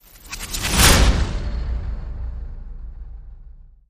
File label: Hit Shred Whiplash